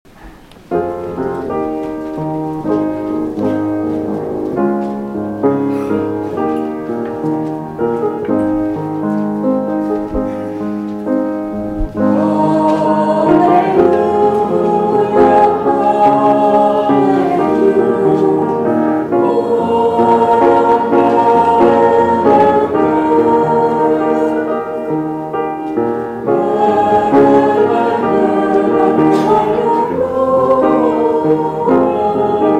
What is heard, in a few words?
Gospel Acclamation